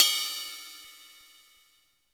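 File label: cymbal ride, hall kits; Crypton - DrumBase5000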